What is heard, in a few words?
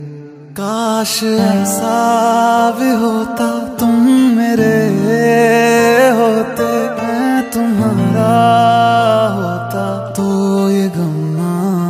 Sad Ringtone